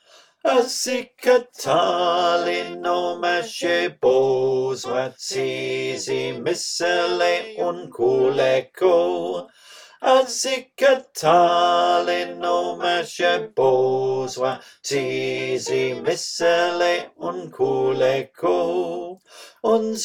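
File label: Bass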